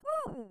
achievement moderate; VIVAE; vocaltrax

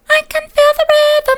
i can feel the rhythm soft, vocal

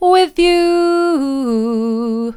with you mid, vocal

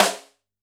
Snr, drum

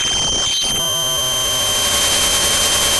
pop, Sound of a cat meowing

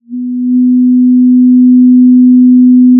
pop, Sound of a cruise ship horn

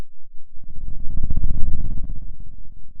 Sound of a dinosaur

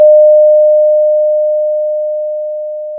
pop, Sound of a school bell

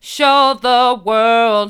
hard, vocal; show the world

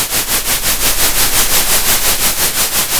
center, Sound of a train

